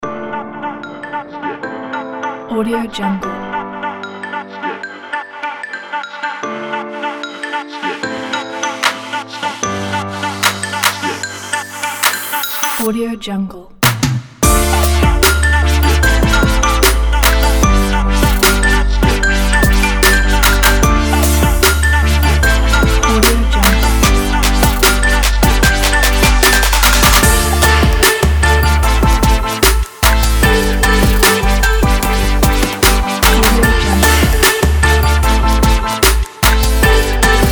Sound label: promo kit